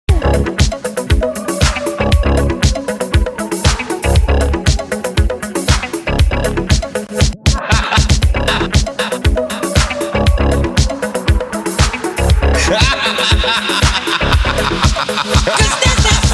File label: Epic Intro Edit